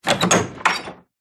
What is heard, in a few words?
Door Open